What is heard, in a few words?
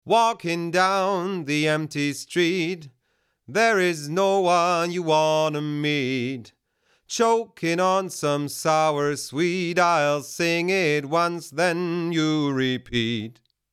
Vocal; Black Lion Audio Bluey; Waves CLA-76